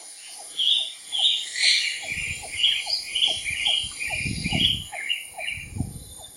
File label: Yellow bellied Bulbul calls, Kubah National Park Sarawak Borneo Malaysia